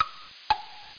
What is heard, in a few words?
ticktock; Cards for Kids